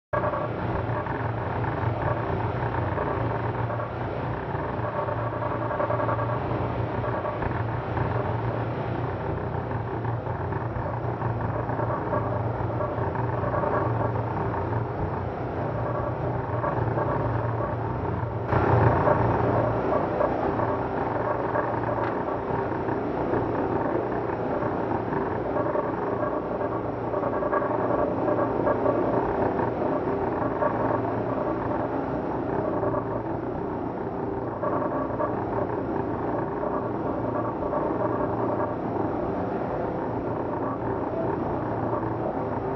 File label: motor